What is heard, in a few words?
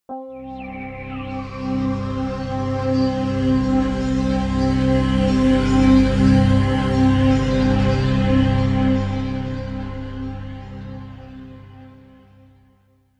Cascade Synth